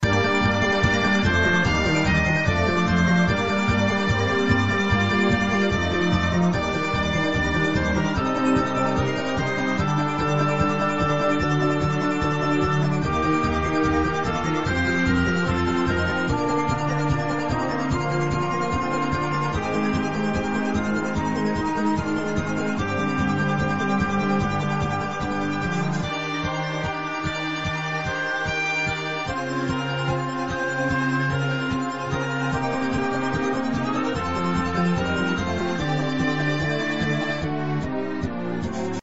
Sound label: bgm